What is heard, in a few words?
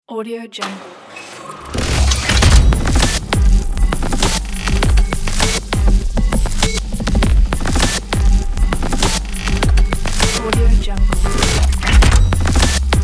action glitch logo